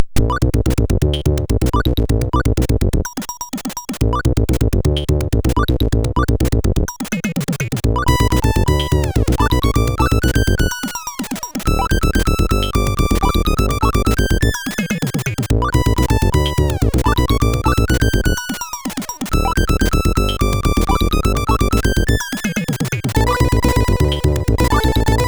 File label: Break 64, sid CSG8580R5